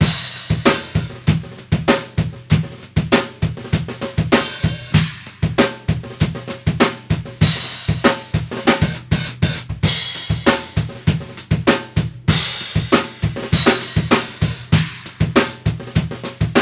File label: dry drums